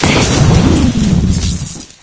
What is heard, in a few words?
scanner explode crash; scmod; synthcrab